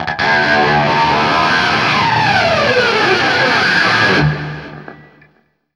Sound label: scrape, guitar; East West; Steve Stevens Guitar Samples Collection